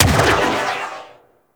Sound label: Railgun Biped Shot; Venus Bipeds; Venus Railgun Biped Agent